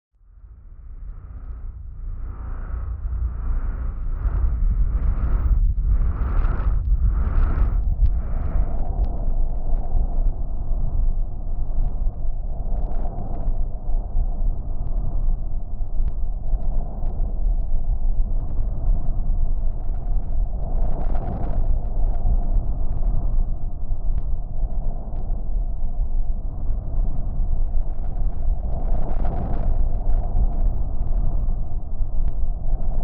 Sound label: breath